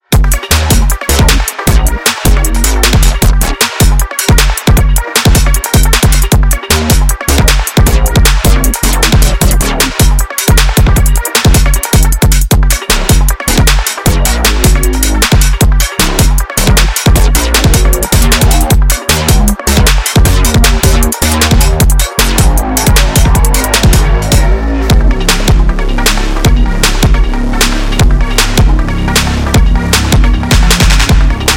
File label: Sonido Profundo (Venus Bass)